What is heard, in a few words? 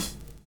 VINYL HH, Drums Dance & Groove; USB Soundscan vol.11; AKAI; VINYLKIT